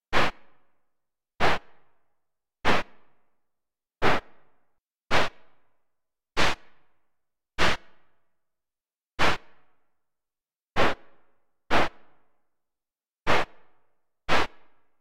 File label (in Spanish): pasos arena